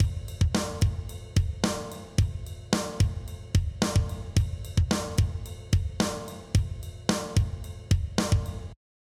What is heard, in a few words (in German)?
drums focusing eq aktiv mit saturation; softube passive-active pack testbericht